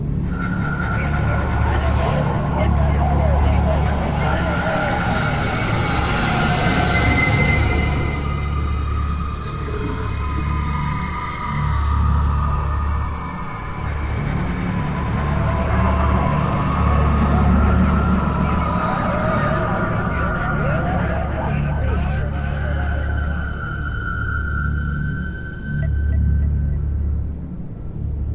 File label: Eerie Portal